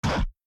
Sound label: Great Sand Shark Hit